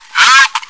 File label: run